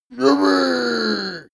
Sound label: male cheer yippieh